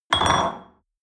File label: espresso upset table medium short